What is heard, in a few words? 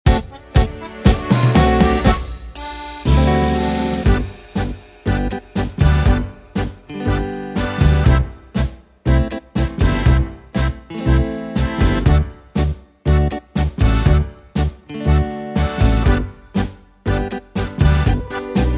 60s Tango Style